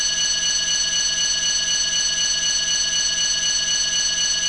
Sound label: alarm